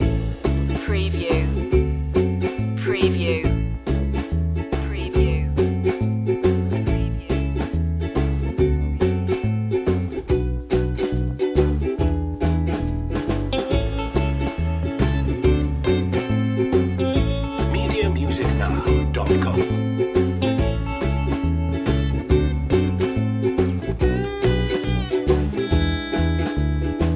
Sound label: joyful ukulele loop